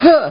hit; BattleSNDS

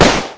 HK53 loop, 5.56x45mm; Caliber

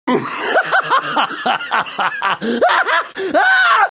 LAUGH; BattleSNDS

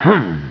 humm; BattleSNDS

